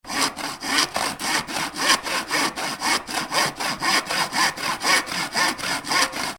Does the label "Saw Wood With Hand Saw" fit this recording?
Yes